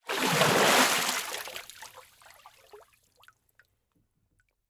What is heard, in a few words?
Liquid Water Water Splash Wave